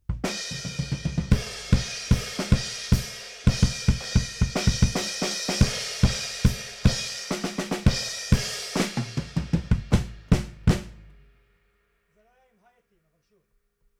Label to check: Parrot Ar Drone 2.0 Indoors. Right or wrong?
Wrong